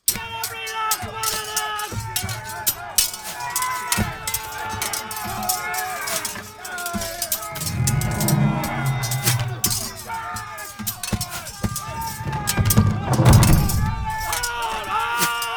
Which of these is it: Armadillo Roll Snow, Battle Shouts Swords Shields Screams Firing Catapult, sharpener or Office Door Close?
Battle Shouts Swords Shields Screams Firing Catapult